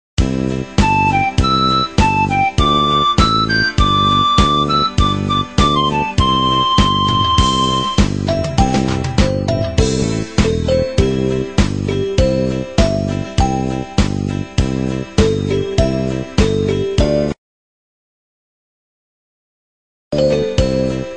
nhac khong loi, beat

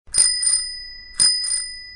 chuong xe dap

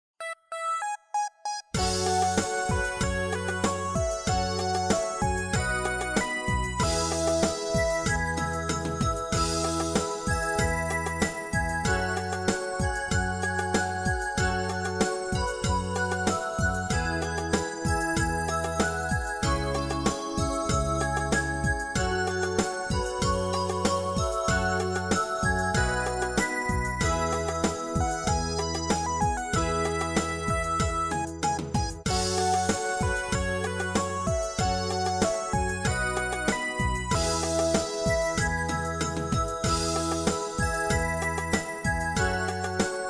beat, nhac beat

